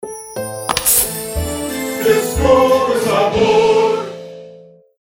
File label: ring